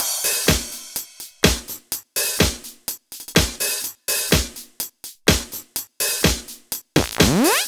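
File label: Rock Roll No bass drum, Drum Loops 125bpm; Zero-G; Soundclash Nu Dance Electro; Acidized Wavs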